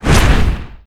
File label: melee miss; gnarr; The Monster Plane; kolmods